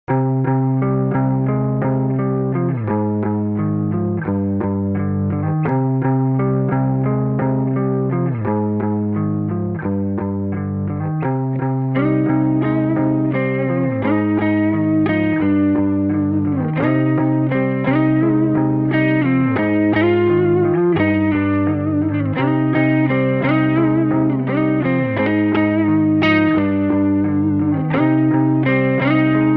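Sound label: rock instrumental background music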